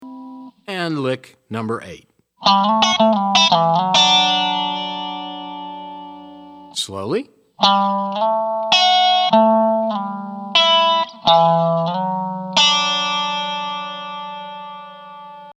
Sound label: resonator pedal, steel guitar